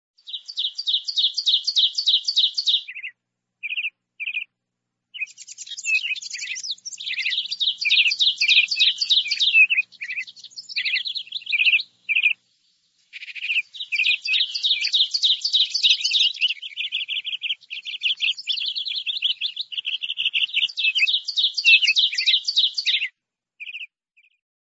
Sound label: Birdsong; Wake Up